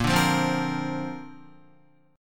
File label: Bb Major Add 9th, Bb, down, Guitar; strummed; JGuitar